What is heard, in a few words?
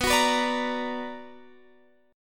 B Minor Major 7th, Guitar, Open F; BmM7 strummed